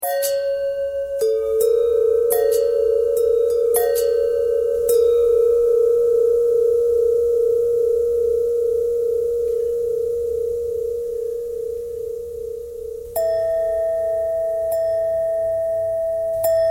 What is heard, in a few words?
bell longbell thread bendthread